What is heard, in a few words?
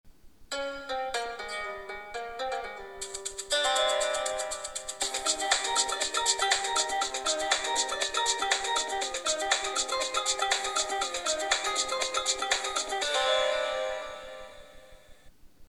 smooth latin; blackberry bold 9700